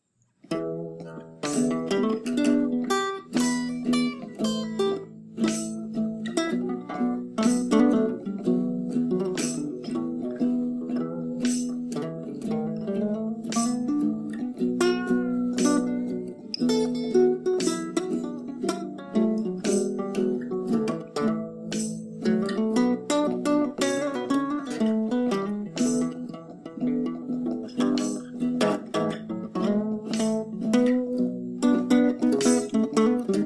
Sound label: Blues